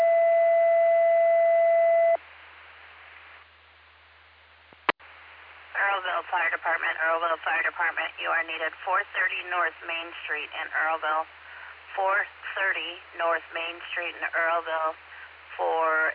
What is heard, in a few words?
Fire; Earlville; TTDAlerts